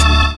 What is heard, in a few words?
FILL; Univers Sons - Kitch 70s